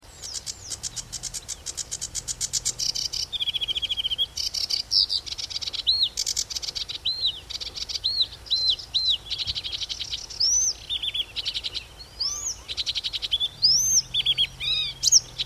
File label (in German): schilfrohrsaenger